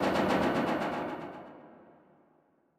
damage hits explosions

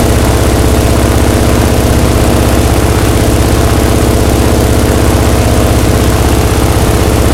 hellcat side, engines; War Thunder

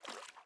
ui pickup water; Rust

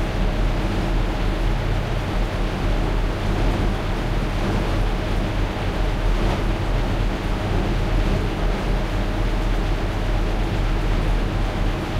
panther engine int rpm, engines tanks; War Thunder

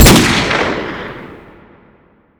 desert eagle; Modern Warfare

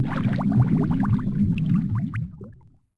Underwater; Civ4 warlords

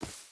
footstep boots grass run; Rust

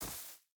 footstep burlap grass run; Rust

